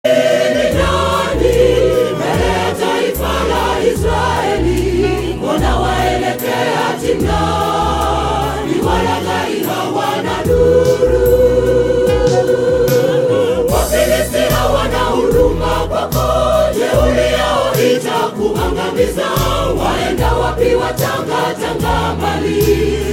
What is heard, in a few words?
Choir; Kurasini SDA Choir